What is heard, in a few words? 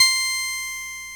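ORG Vox, Continental organ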